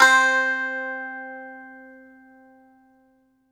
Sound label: BAGLAMA, Plucked Strings